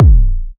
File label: dope kick sound b key